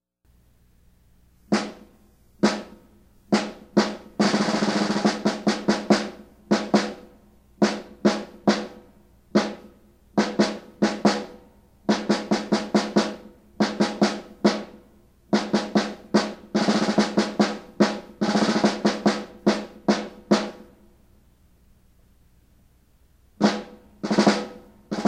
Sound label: snare; ILMEA